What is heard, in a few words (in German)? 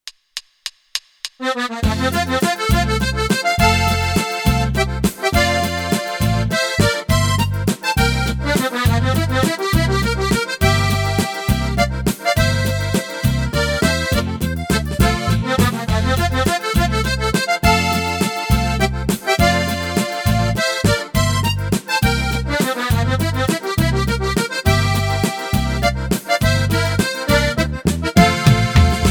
Leichte mit Melodie